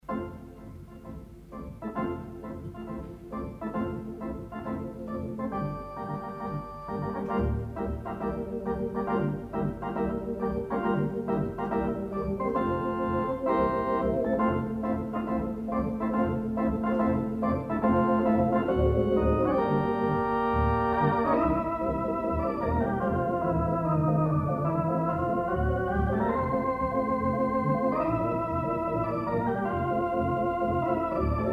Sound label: Hammond B-3